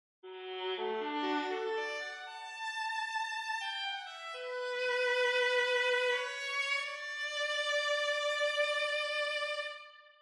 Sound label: Viola